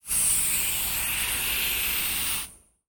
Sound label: air compressor